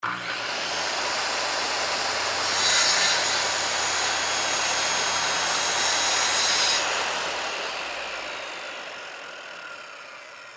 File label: Industry, Circular, Saw, Cut, 2X4, Wood, On, Run, Off; Saw Sound Effects